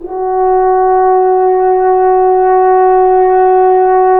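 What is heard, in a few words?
brass, F.Horn Solo pp; Roland L-CD702; F.Horn 3 pp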